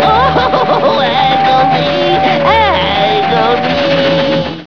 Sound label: agony